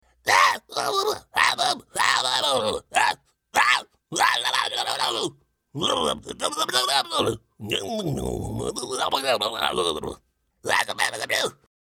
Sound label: Small Humanoid Goblin Noises